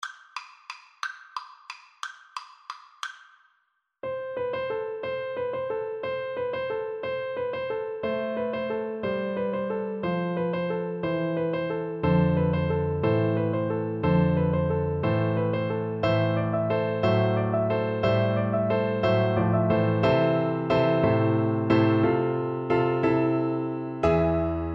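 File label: carol of the bells, xylophone; Percussion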